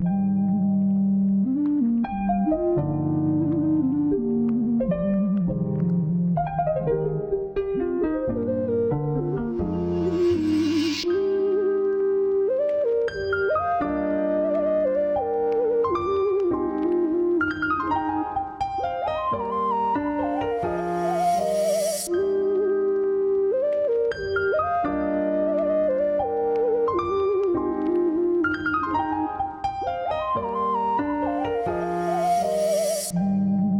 87 BPM C Min Piano + Lead; Melody; RnB; Loop Stems; Cymatics; 2022 Melody Collection; RnB Pt 2; Thunder

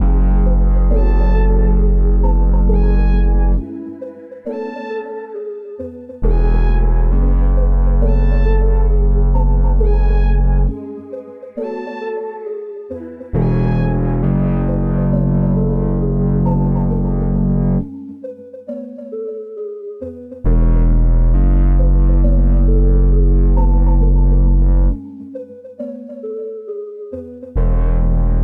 Eco 135 BPM F# Min; Cymatics; 2022 Melody Collection

